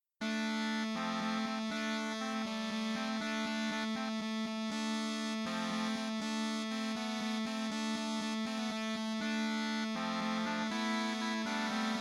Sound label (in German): krummhörner; ERA-II von Eduardo Tarilonte; ERA-II Software; Best Service